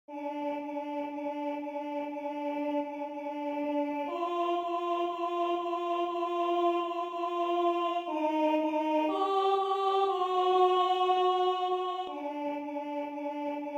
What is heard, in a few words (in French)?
Sanctus Alto; Messe De San Lorenzo